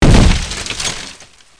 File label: crate, impact